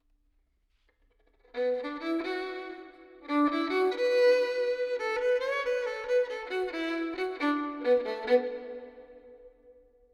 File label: violin verb 4-4 Bm 70; Sample Banks